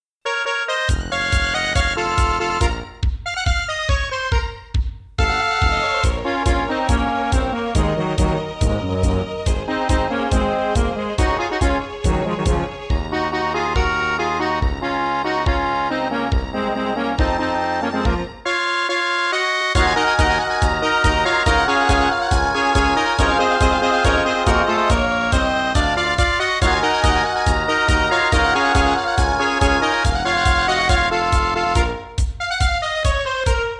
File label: tango